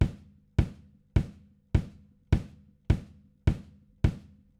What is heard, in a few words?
kick after